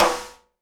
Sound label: SNARE RIM, Live Snares; E-MU Producer Series Vol. 8 – Platinum Phatt; PlatinumPhattCD1